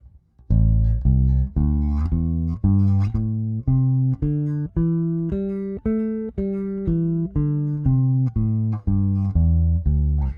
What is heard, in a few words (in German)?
Aguilar Super Double Both PU aktiv